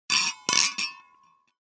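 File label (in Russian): застолье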